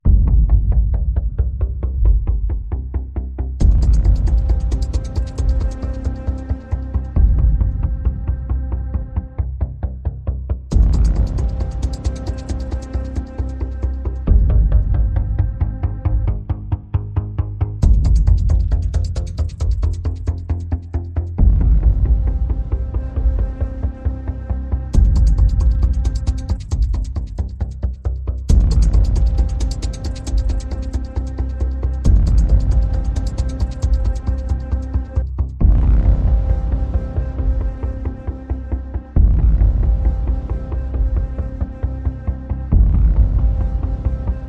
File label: Perc Pulses and FX Only